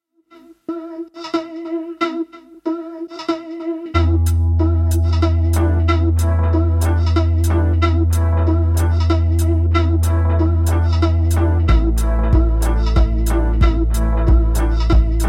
for 3 electric guitars electric bass drums